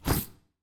mob formation stack

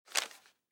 ambience room shadow room working layer

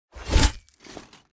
crusader strike sword

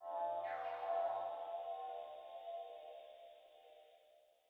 ambience room necromastery magic layer

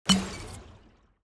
projectile; necrochemist; Shelter

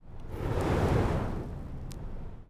ambience room forge working layer

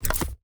ui submenu open

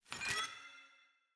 ambience room witch lodge spell ready

